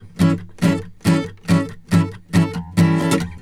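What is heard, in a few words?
Country Guitar, Pre Bridge; Digidesign; Pro Tools Essential Loops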